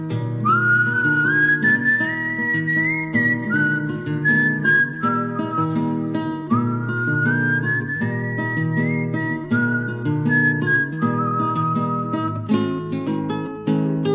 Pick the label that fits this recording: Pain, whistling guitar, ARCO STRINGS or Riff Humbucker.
whistling guitar